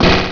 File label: BANG